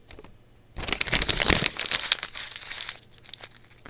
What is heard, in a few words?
Crumple Paper